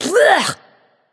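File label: clear throat, female; smite; voicepack; hera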